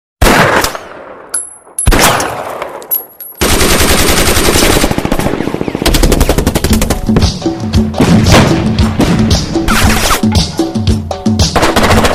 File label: Firing